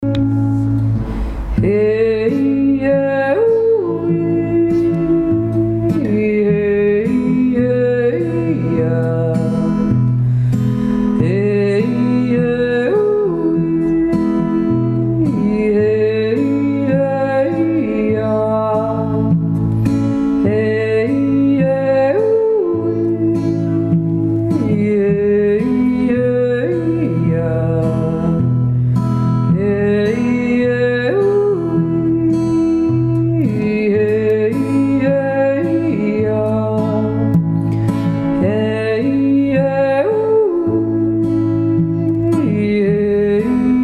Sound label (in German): eissch tzenjodler